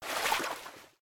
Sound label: water splash, footsteps